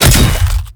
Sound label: Ghoul Devourer Body Impact; Warframe; Grineer Ghouls